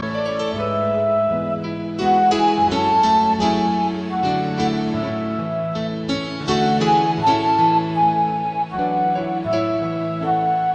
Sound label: Instrumental